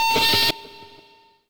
feedback

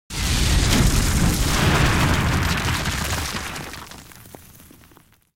explosion material ground; War Thunder

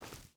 footstep hide forest walk; Rust

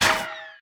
damage hits explosions; World Of Warships

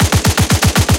loop m2, guns tanks; War Thunder

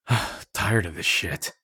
tired; Escape from Tarkov

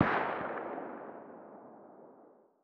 thompson attack far distant; Rust

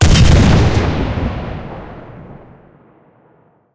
cannon 57mm qf6 shot, cannons tanks

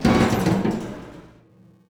swing strain catwalk

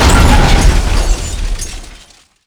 polycraft wreck impact

